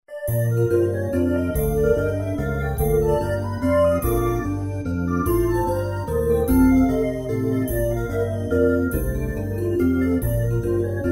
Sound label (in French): valse foraine